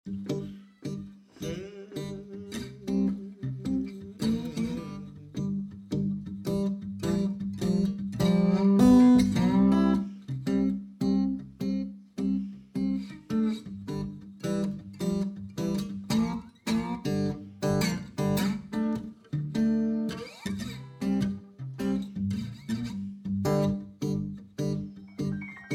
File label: Full house blues